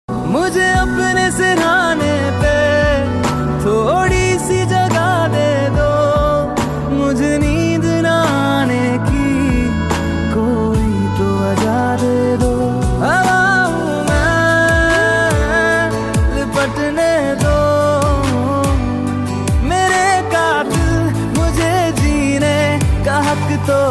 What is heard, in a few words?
Most Romantic Heart Connector Ringtone; ringtones